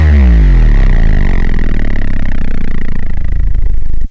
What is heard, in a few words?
Rushin Bass; AMG - Prototype Drum & Bass